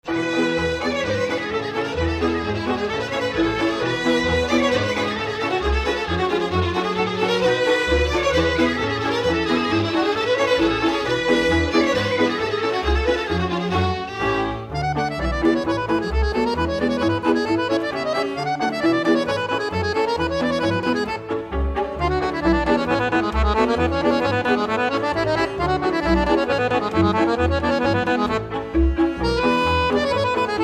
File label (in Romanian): acordeon, Hartag